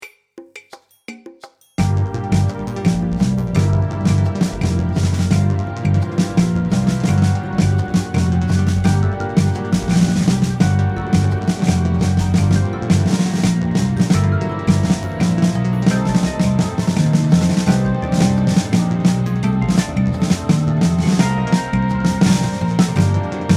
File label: w snare